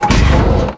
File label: biomech mechanism buildup